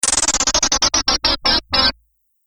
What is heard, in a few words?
Whoosh Slow Down Stutter; Whoosh Sound Effect; Swish, Zoom, Swipe, Whoosh, Swoop; Andrew Fleming